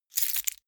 key ring large grab hard

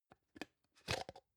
plastic can small lid open soft

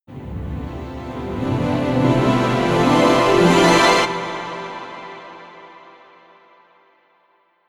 alienated harp rising abrupt stop

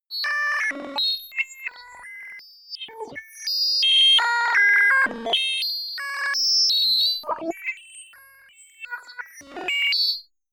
random calculation

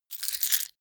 key ring large grab

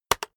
keyboard external enter hit very fast

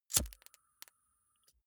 lighter wheel switch on flame crackle

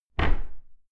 cupboard close heavy

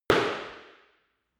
impact hard indoors short

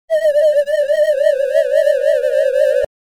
whistling sweep

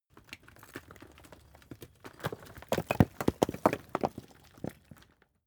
stone medium landslide short

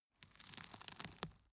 gravel drop soft short

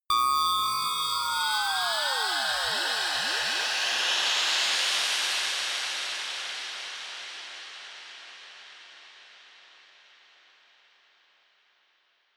creaking noise riser

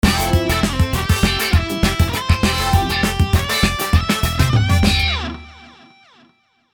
glance of 70s

